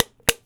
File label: Small Can - Dent; Micro Pack